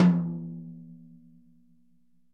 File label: Tom mf; Multisamples; Digital Sound Factory